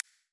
deck ui slider down; steam; steamui

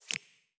deck ui switch toggle off; steam; steamui

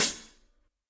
drum hit whistle; osu!

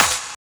normal hitclap; osu!

